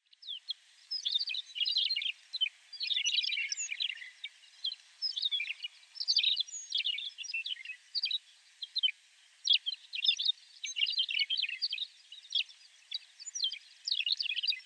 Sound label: McCowns Longspur Rhynchophanes mccownii